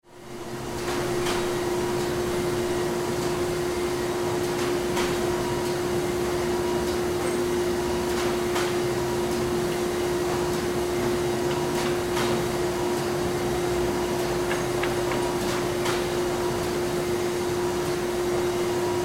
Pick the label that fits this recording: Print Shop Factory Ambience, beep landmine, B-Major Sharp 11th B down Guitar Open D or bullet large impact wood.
Print Shop Factory Ambience